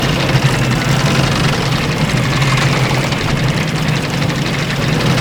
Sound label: windmill small gear; LoL; emitters map8; odin